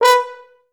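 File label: FHS1 staccato B3, Advanced Orchestra; Best Service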